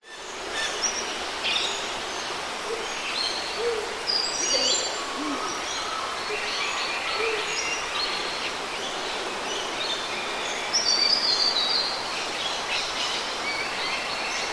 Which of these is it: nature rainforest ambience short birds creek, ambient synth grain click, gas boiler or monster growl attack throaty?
nature rainforest ambience short birds creek